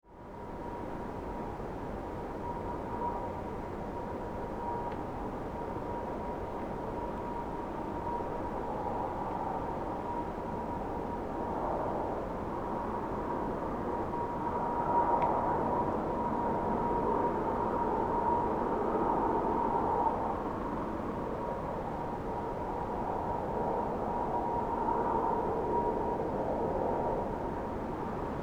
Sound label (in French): Jarrie vague, Centre de basse-Jarrie : des sonorités par vague